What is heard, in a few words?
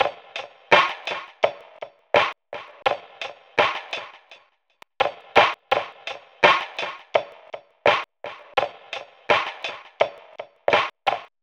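LoFi Lite Groove 084, Lounge Drumz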